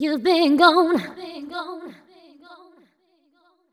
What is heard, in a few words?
f 128 mel e, fem Vocals